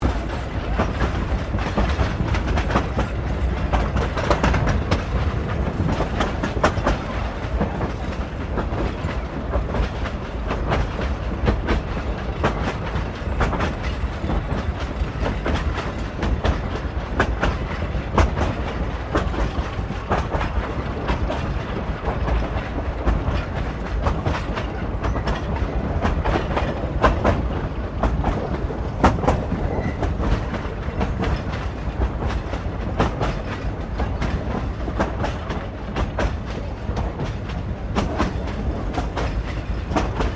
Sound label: Train Carriages 1937 Exterior Rolling Fast Loop; Bellarine Tourist railway vehicles